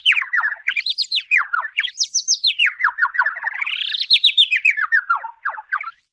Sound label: cartoon birds; daniel simion